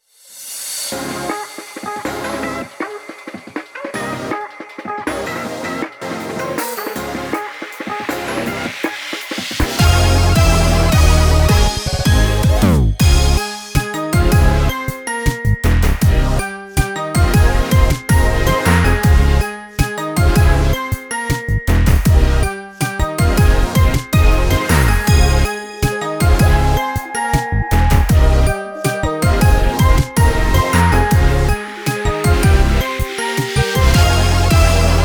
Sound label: BGM kawaii 159